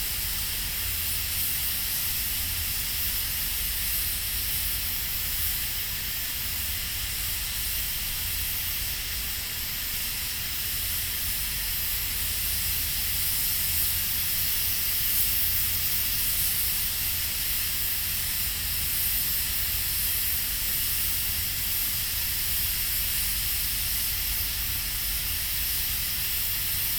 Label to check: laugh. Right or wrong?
Wrong